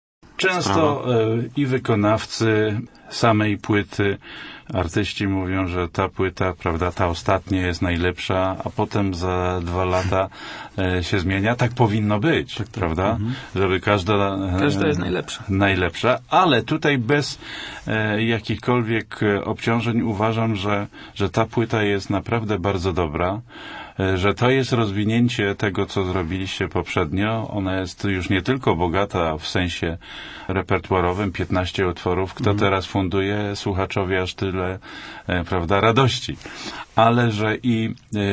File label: blues flow